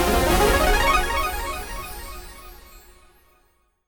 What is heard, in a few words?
music mega win text change; reef raider